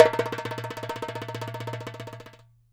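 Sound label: DARABKA roll, Mediteranean Traditions percs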